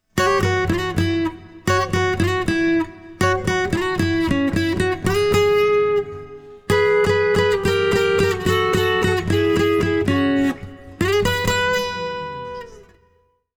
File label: Aco Gtr Solo